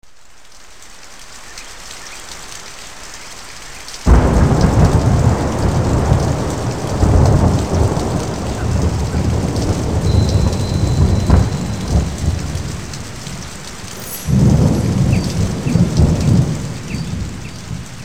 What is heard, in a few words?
African Rain (meditation music)